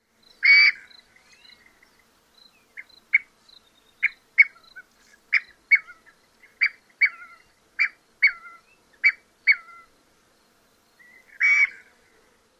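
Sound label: scaled quail queee & kek-kyurr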